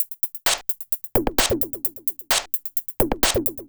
Beat 130BPM (Drums No Kick), techno; techno drum fx

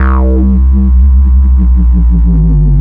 DnB Bass 170 C, drumnbass 170bpm; musicradar; Basslines B

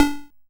Xylo D1; multi-samples; CHIPSHOP DELUXE

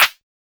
Clap, techno drum

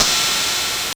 GB Ride, cymbals; DRUMS; ChipShop Deluxe; ChipShop Hits

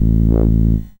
SID Bass A; CHIPSHOP HITS

